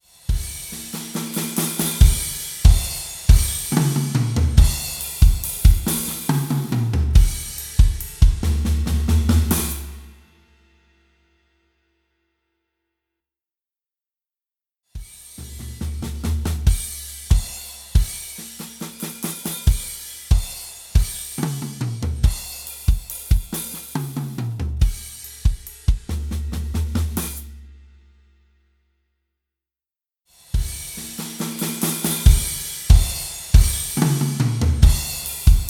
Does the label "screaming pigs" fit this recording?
No